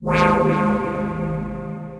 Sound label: Bobble Drone; Ambient & Evolving; Ableton; Glitch and Wash